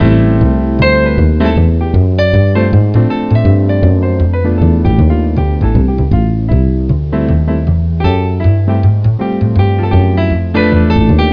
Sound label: Jazz Walk Solo Loop; Background